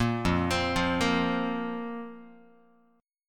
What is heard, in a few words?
F Suspended 4th Guitar, chord; Fsus4 arpeggiated; 1,1,3,3,2,x; JGuitar